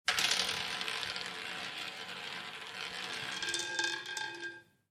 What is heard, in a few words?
Roulette Wheel